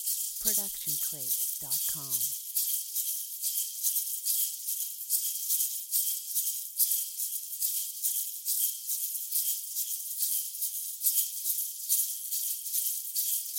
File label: egg shaker fast; SoundsCrate